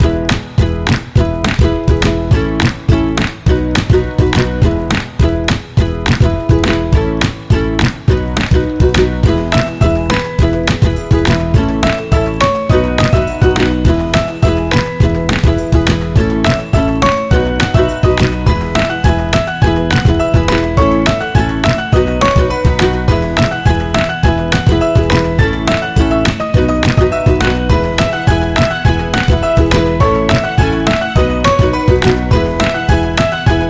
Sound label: Upbeat Happy Loop